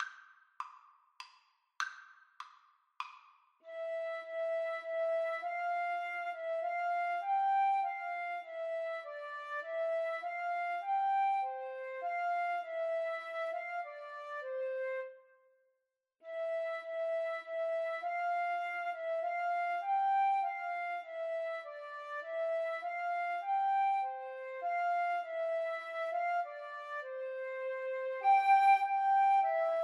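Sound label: flute duet karaoke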